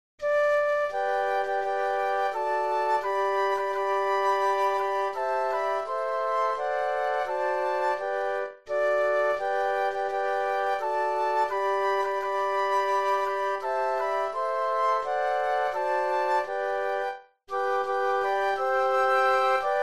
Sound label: Flute Quartet; christmas